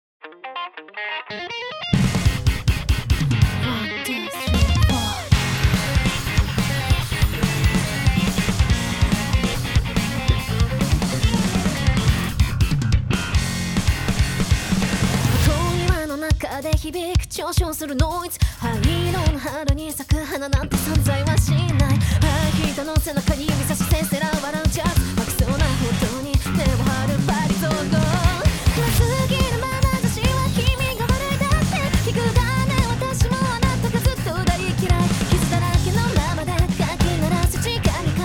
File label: Backing EG Minus One; NCS オーディション楽曲